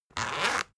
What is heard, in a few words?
zipper open; objekte; reissverschluss